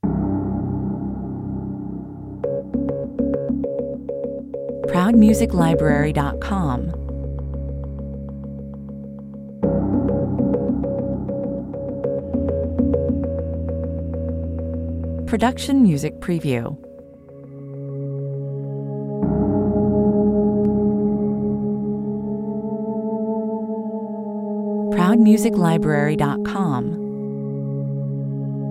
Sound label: Deep drones, dark synth pulse, glass pads. Horror, scary, science fiction. Airy, ethereal strings, 100 bpm; over deep piano wire clanks.